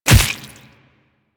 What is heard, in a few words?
Infested Splitter Gore Spew Burst; Warframe; Grey Strain